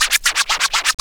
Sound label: Scratch; Club-50 - Foundations Roland